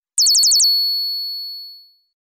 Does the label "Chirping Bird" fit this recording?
Yes